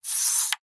technology mixing desk fader slide slower

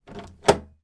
household door wooden internal open; sound effects one

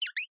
cartoon mouse squeak high pitched cute

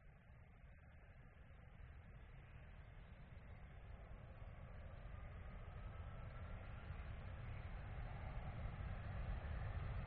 vehicles aircraft private jet take off distance

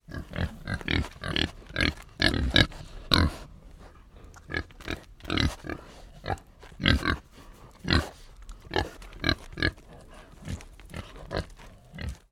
pigs kunekune x2 grunt snort

